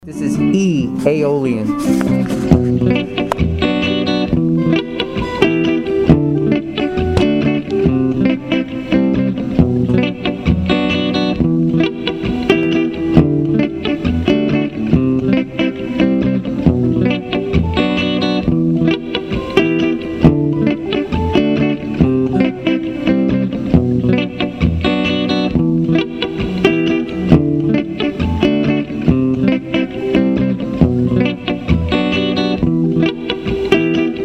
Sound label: modes in key G E aeolian